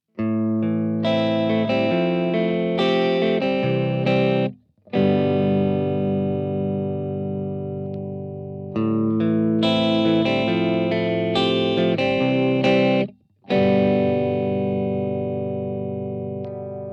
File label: Clean, pickups